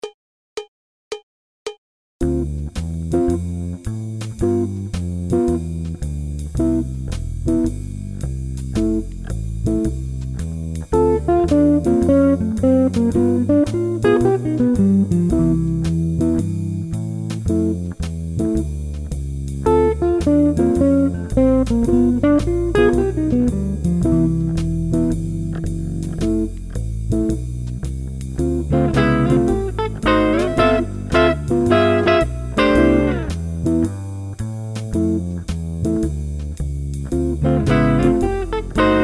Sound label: jazz; 50 licks